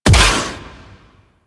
Burst Explosion, Weapons; Warframe; Tenno; Miter

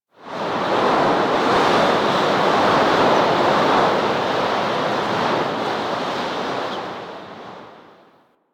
Wind Stinger From Right, Mountain Pass; Ambience; Warframe; The War Within

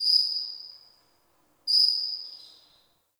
Cave Ocean Creature Far; Midrath Island

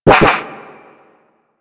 Shield Deflect; Warframe; Hayden

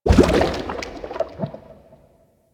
Landscape Coolant Gurgle; ambience; props; one offs; Warframe; Venus Open World

